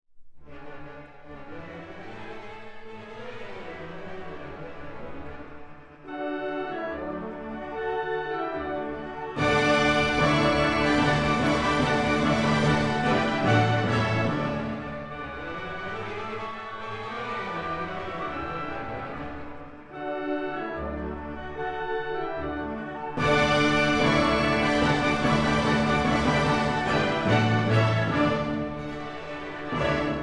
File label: Sync Wavetable Soni